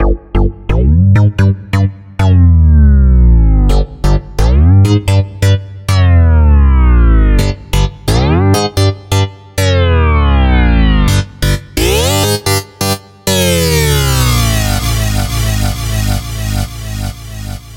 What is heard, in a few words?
Synth groove 130bpm No Kick